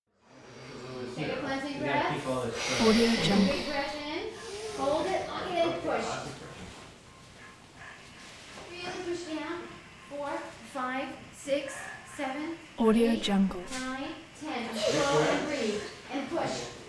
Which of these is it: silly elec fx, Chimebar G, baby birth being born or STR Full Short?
baby birth being born